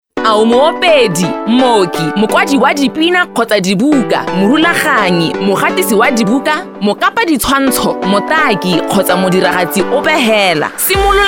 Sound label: Membership jingle